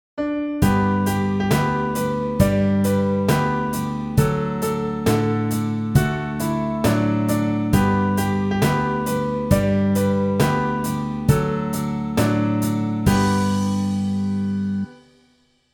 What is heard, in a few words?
Wheels on the Bus, keyboard